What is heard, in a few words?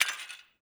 office occasional